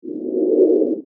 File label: Soft Sliding Transition Whoosh; whoosh sound effect; swish, sweep and swoop